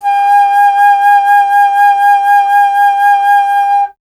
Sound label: Alto Flute, Solo Instruments; Miroslav Vitous - Solo Instruments VOL-2